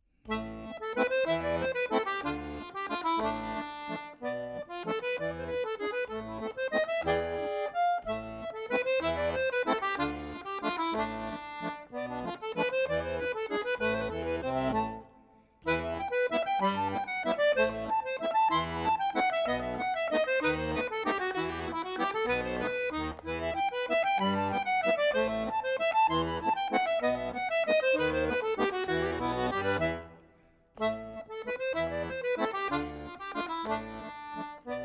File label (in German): solo akkordeon